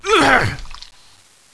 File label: Pain Female